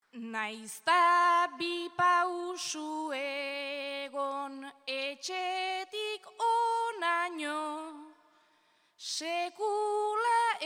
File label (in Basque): agurra, Euskal Herriko Eskolarteko Bertsolari Txapelketa; Euskal Herriko Eskolarteko Bertsolari Txapelketa. Finala.